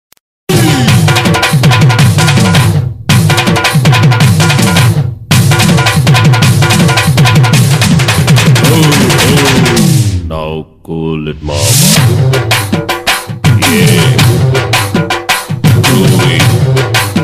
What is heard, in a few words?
mass ringtone, kuthu; dhool; viral music trending